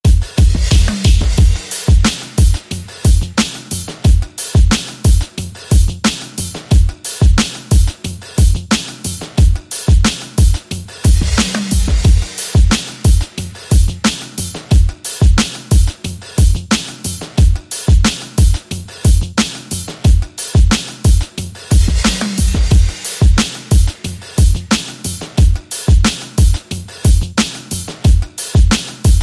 Beat

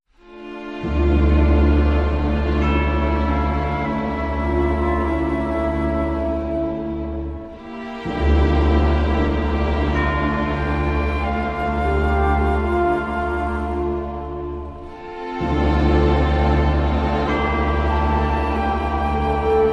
Orchestra Only

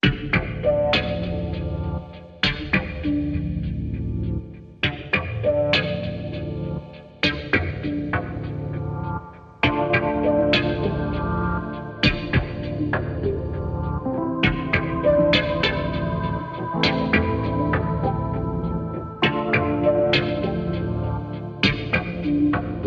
Beatless

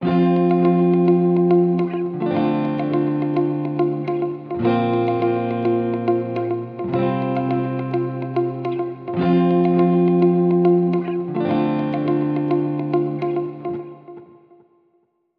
Bright Confident Beginning, No Drums

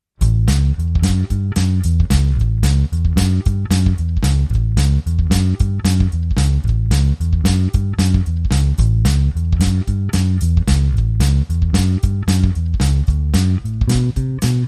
Bass Walk

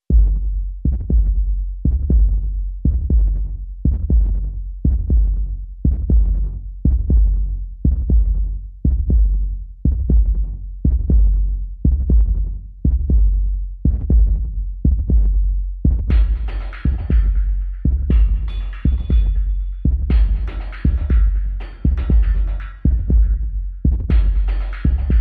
Drums and SFX Only

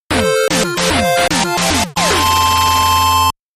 First Gate Jingle

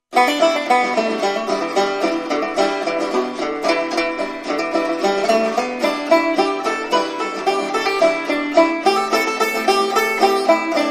Blues

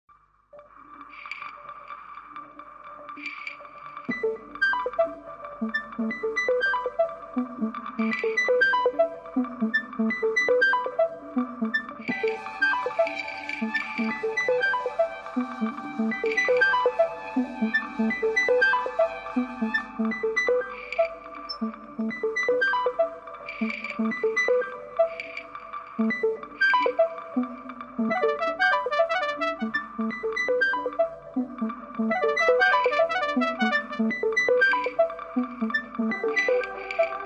Bleepy

